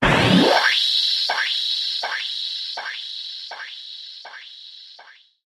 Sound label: Air Impact Filter, stinger